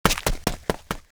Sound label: run